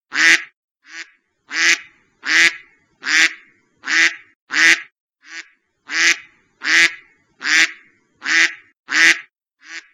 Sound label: duck